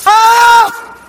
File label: scout pain severe